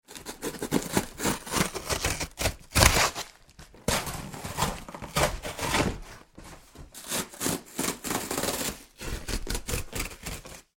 Tearing Cello tape off Cardboard Box - Various moves; Plastic; Tom Hutchings